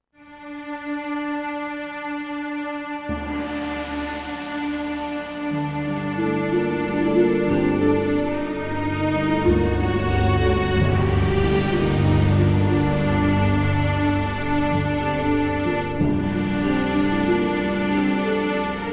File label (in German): Music Arabisch; rise of venice windows gamerip 2013